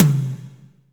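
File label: tom, drums; Best Service; Real Mega Drums VOL-1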